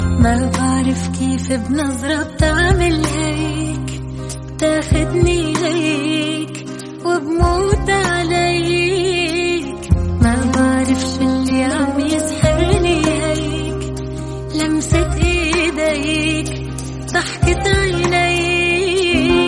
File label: arabic background music song tone ringtone